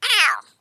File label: Minion Hit